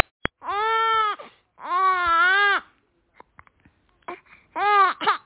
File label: Baby